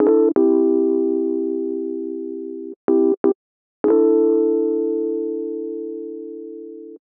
125 Rhodes Dom A, Keys, Nu Jazz House

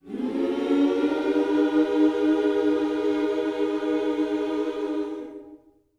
MM MINOR, Gospel

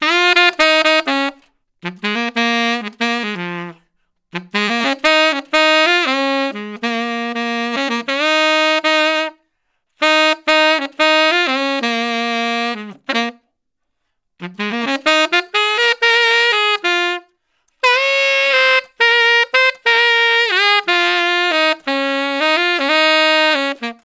Tenor Solo, Jazz, Traditional, Tenor Sax

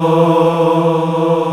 Choir; East West - Global Sounds

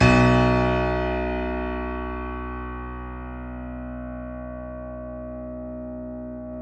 B 0, YC7 Piano mf

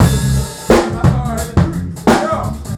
not so hard 87bpm, Hip-Hop Street Beats; Sonic Foundry; Hip-Hop Street Beats Construction Kit; Street Beats by Poogie Bell

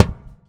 Clang, Kicks; Airwindows

